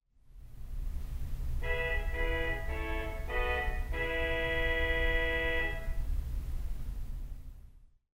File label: Vox